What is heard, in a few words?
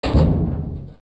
Door Metal Close; zelda; OOT